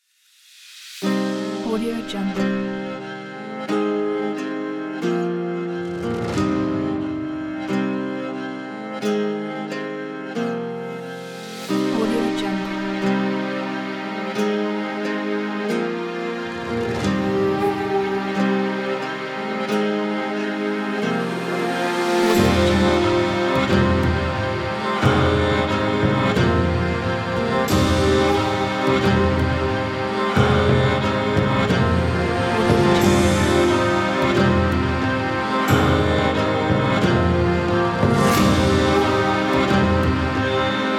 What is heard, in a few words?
vikings story